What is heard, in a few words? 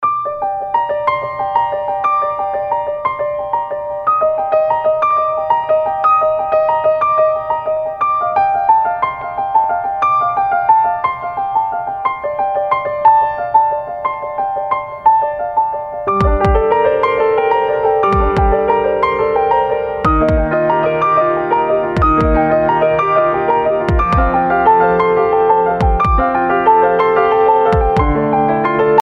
spring waltz remix; frederic chopin; english ringtones